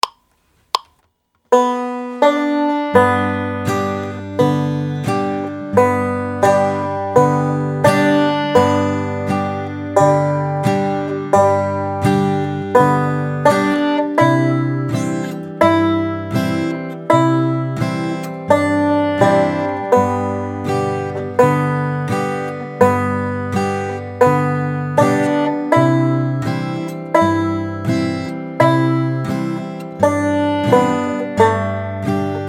Blues

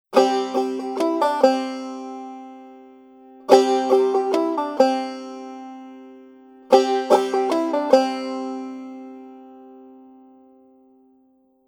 Thwack

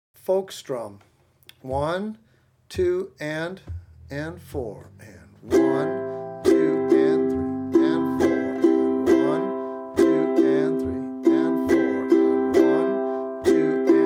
Folk Strum

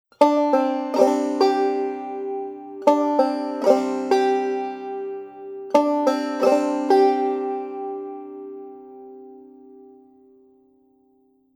Drop Thumb

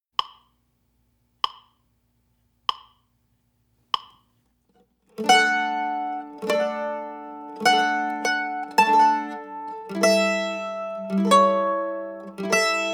Simple Strum; Skip to my Lou

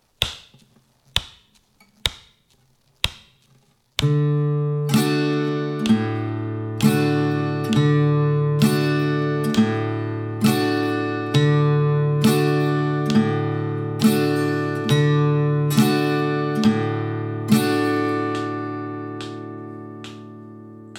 Alternating Bass Strum

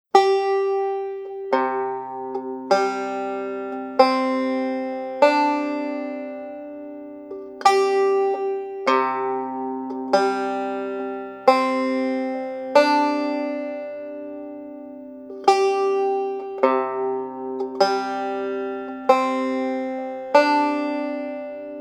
Double C Tuning gCGCD

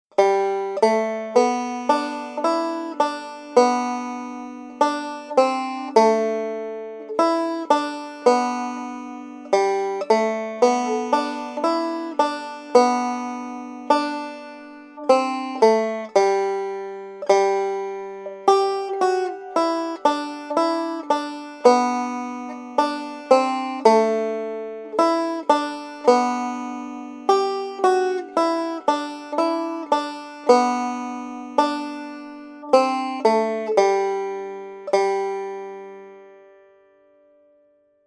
Melody; Buffalo Gals

